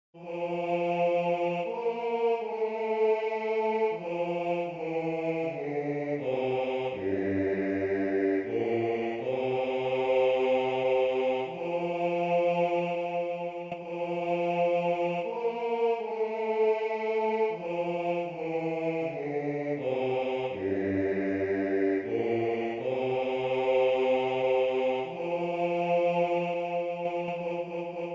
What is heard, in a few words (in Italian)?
Tu sei sacerdote Basso